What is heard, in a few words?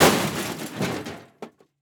Crash Aluminum Garbage Can Dropping On Pavement; crashes; AdobeCC